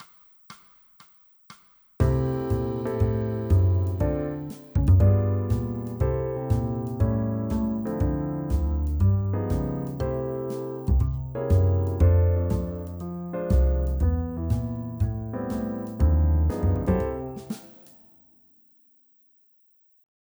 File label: bass; AI Jazz Bass Demo 1